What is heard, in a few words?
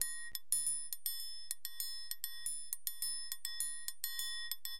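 triangle; LiveAct Pool; AcidJazz Funk; Perc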